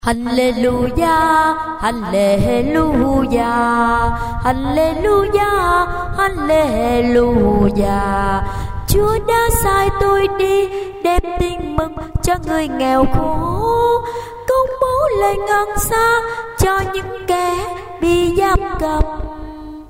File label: tenor basso